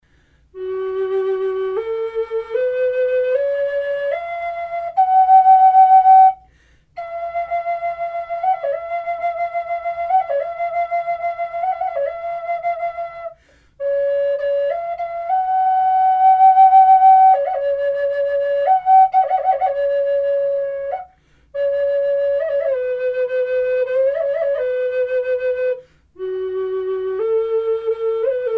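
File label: natural, flute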